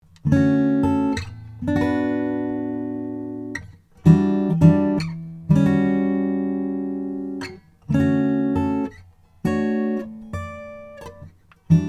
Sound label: grand cathedral